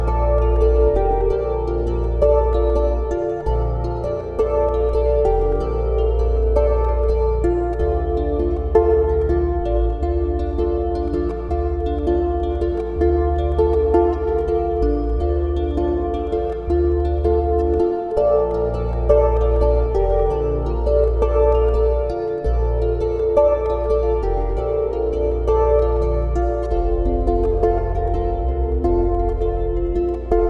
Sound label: Wedding Music